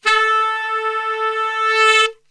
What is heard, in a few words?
A 2 SAX, Brass Super Section; Best Service